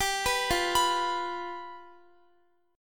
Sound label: F Suspended 2nd Flat 5th, Guitar; Fsus2b5; arpeggiated